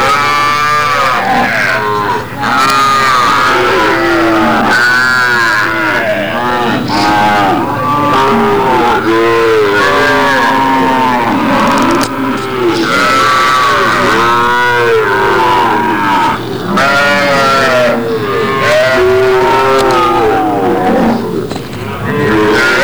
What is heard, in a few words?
call, cow